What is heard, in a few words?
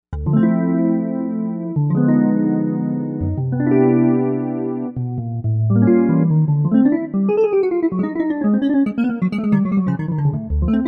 Synth Jazz Guita; Novation UltraNova; Factory Patches